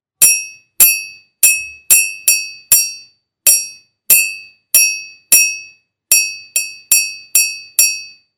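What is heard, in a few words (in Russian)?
звуки посуды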